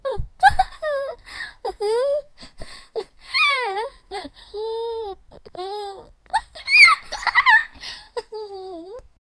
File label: young girl giggling